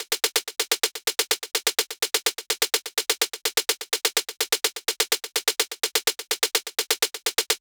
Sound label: Future Rave Kit 126bpm Snare; Drums; EDM Drums; Drum Kits; Stems; Ghosthack; Ultimate EDM Drums; Drum Mega Bundle; Future Rave Kit 07